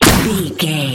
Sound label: sci-fi weapon plasma pistol